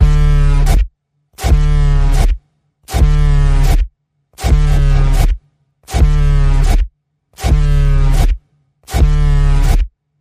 Panning Pulse D, Key of D

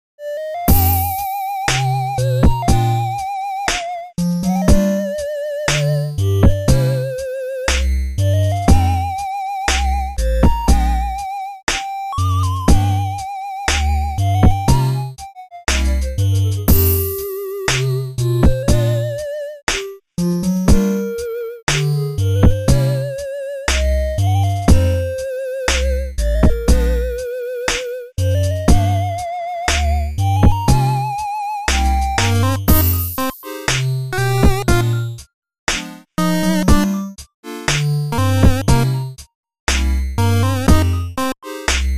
Dub

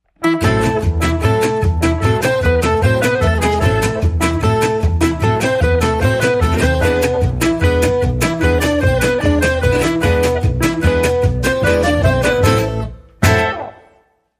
Train Guitar, Jingle

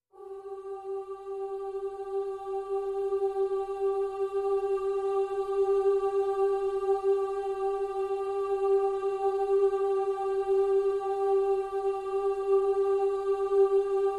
PADS Vocalicious (G)

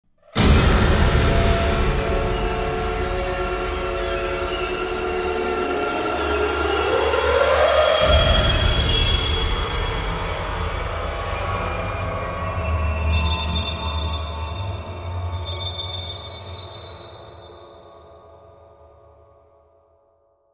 End Sting

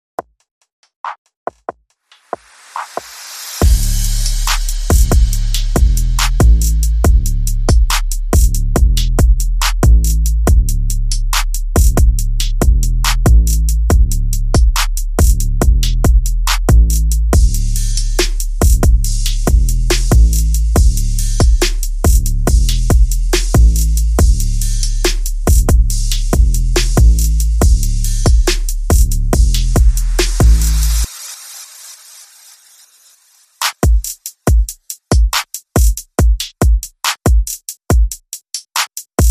Drums and Bass Only

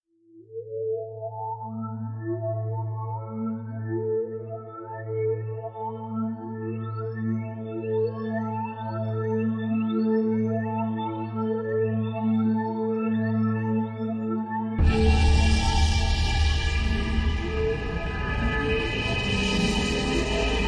Glowing Drone

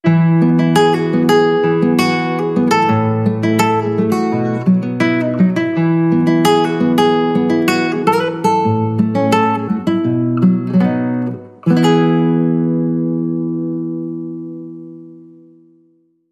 Solo Guitar

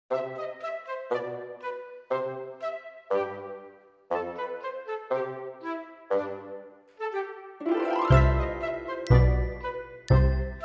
Reduced Strings

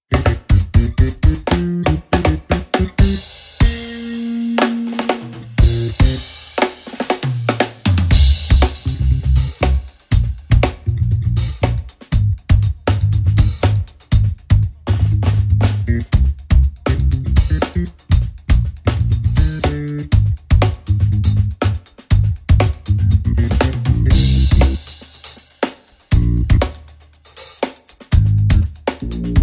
Drum & Bass Only

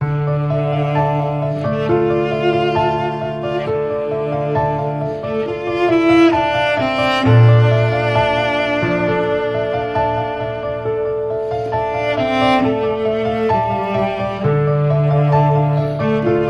Intimate

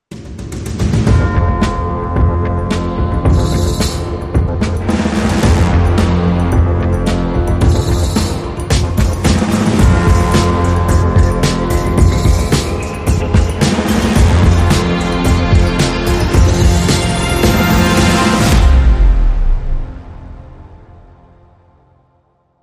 Hybrid Sting

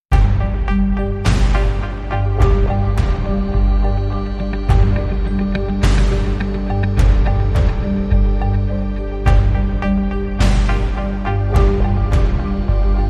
No Strings

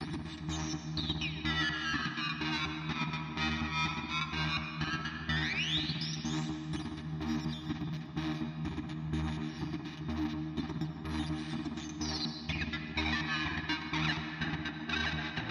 Drone, Percussive Synth

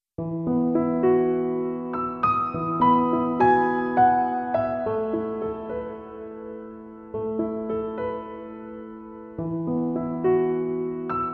Piano Only; 60sec

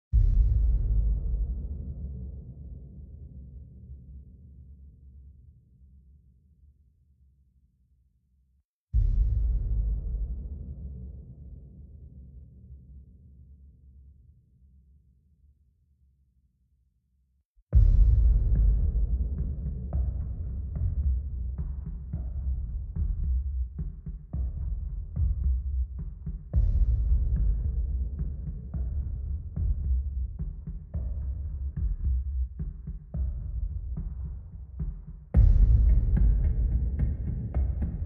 Drums and Bass

